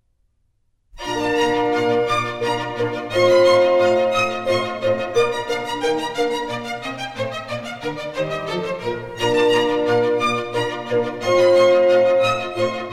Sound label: German Dances, Bb major; Mozart; Dances and Marches